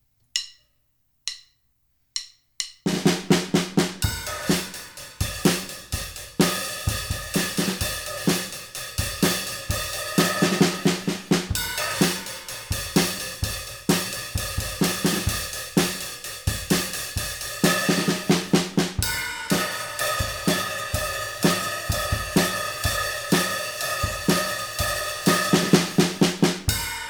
2 piece 2 mic With Ride, mahogany drum kit; comp Eq Verb

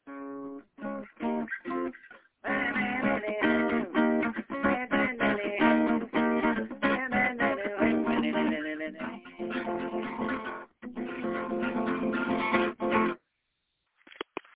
back and forth chorus

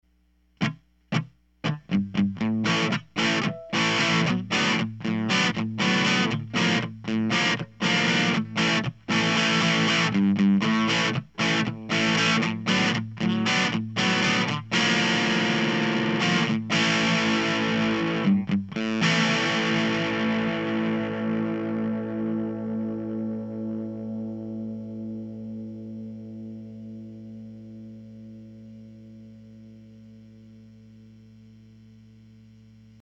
dist Gtr SM57, mic tests

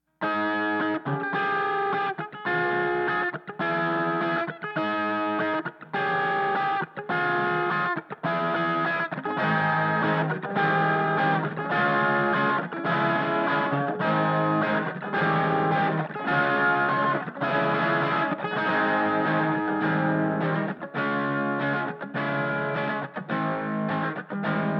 no Comp guitar Bus with Verb

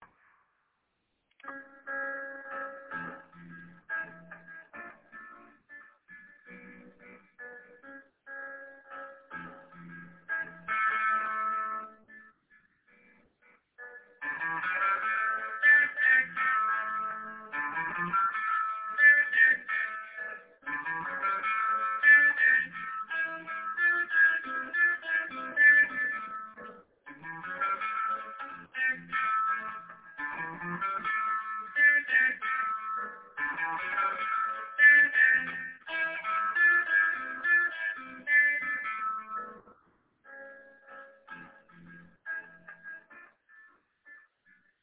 jazzy ambience but with melody

